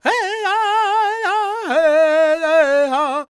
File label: C# MIN, World Voices, LAKOTA